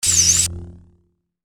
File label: Saber; FXHome